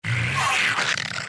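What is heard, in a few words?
gargoyle attack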